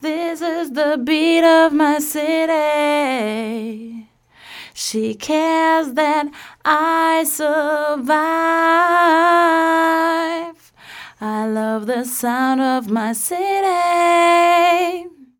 WA 2A Vocal Original

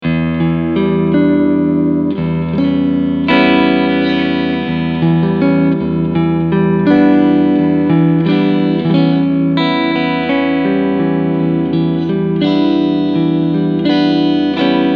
Clean Front SC

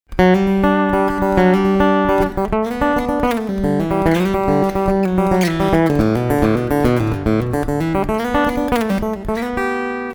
TL 6 linear Picking; ESP LTD

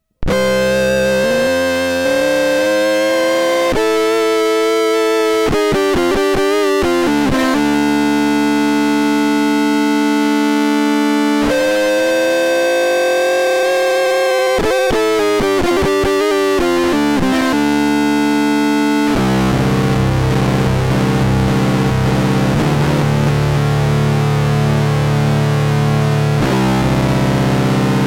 Swarm Fuzz Pedal; Beetronics

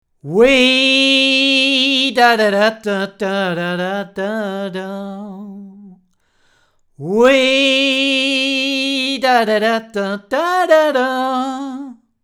C414 Voc

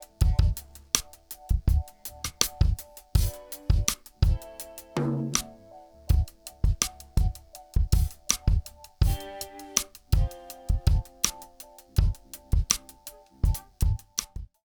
Chandler Zener Limiter TG12413 DRUMS LIMIT

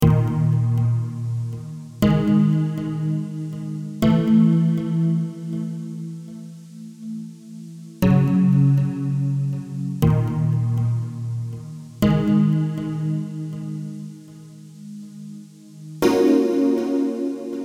JD-800 Model Expansion

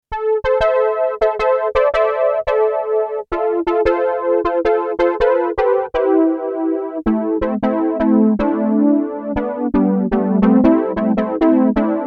PolyGater

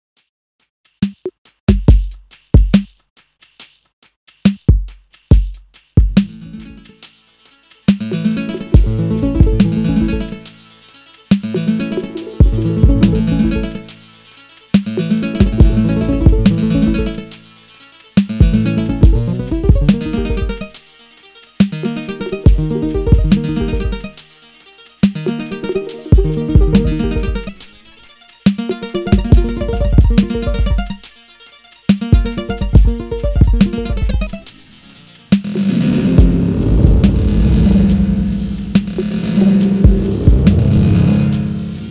Lexicon PCM70 Resonant Chords

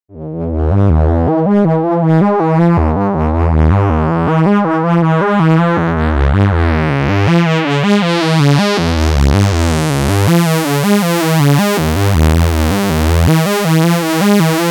Pittsburgh Lifeforms SV 1 Filter Overdrive

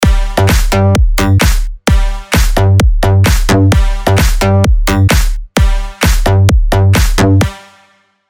EDM Bass Style; Nexus 3

